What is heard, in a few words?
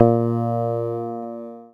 PLUCKED, Synclav FM, A#; Big Fish Audio